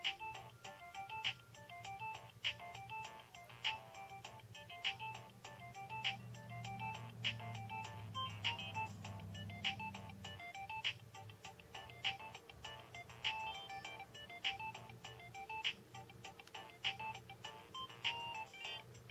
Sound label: nokia 2610 ringtone jig